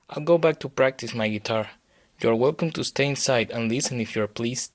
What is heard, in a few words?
guitarist; DeadFright